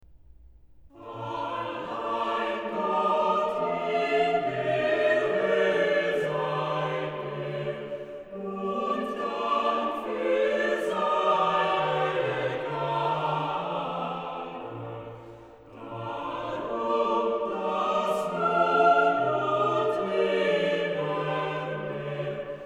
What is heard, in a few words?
Chorale, organ; BWV0260; Allein Gott in der Hoh sei Ehr; BACH; BACH ORGAN WORKS; CHORALE PRELUDES